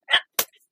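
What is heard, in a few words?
sounds of armadillo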